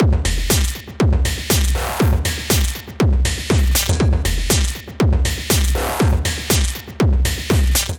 Metal Work Kit, Drums; Ableton; Punch and Tilt

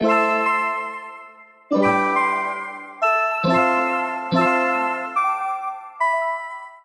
Twisted Chimes A Minor 140 bpm; MIDI Clips; Chromatic; Ableton; Factory Packs; Voice Box; Transformed

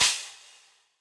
Crash FX Old School, Cymbal; Drum Hits; Standard Hits; Session Drums Studio

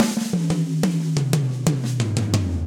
Fill Cold Tight Room 90bpm, drums; Gallop; Ableton; Factory Packs; Session Drums Studio

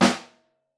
SN1 Room, Snare

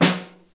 SN Room, Snare; Ableton Foundation FreePacks; Snare Designer

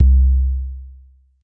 Deep Chill Bass; Synth Bass; Ableton; Factory Packs; Beat Tools

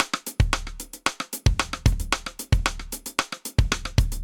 Afrobeat Intro 113bpm, Session Drums; Cop Style; Ableton; Factory Packs; Session Drums Studio

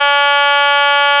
Otamatone; Ableton Foundation FreePacks; Foundation Glitch Toys & LoFi

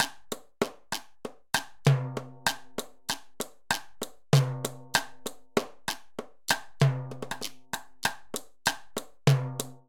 Danzon 112bpm, Latin Percussion; Ableton; Factory Packs

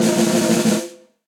Snare Press Bright Room; Snare Articulation; Drum Hits; Session Drums Studio; Standard Hits

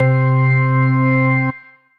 Organ Funk Shimmer, Tonewheel Organ; Electric Keyboards; Ableton; Factory Packs

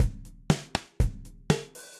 Kit Classic Muffle, drums; Standard Kits; Ableton; Session Drums Studio

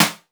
Snare; Ableton Foundation FreePacks; Foundation Drums

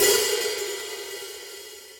HIHAT brush half open

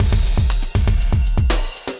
Acoustified Kit, Drums

